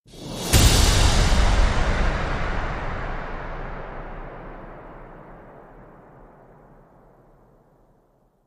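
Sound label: Hit Swoosh Big Crash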